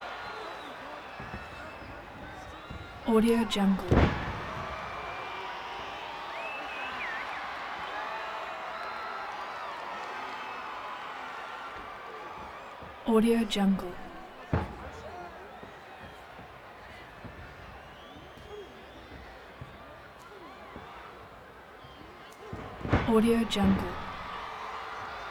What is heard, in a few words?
wrestling crowd large arena venue